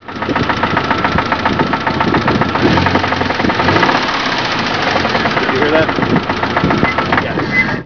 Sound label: knock